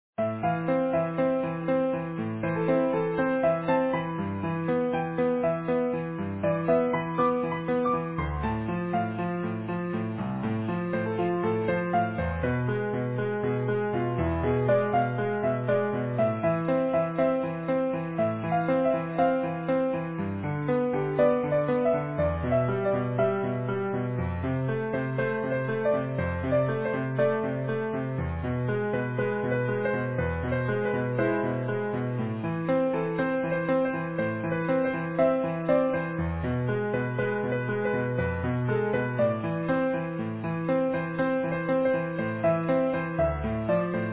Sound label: Easy Piano